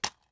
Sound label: rotate weapon, ui